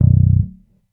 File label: Bass Short Low B, Electric Bass One Shots; Slate Digital BASSHOLE Sample Pack